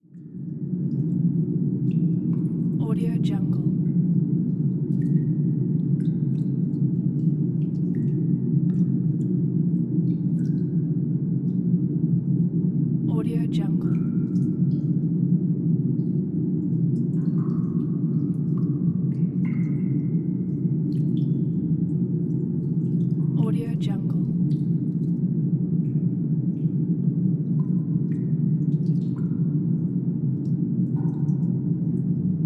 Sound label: underground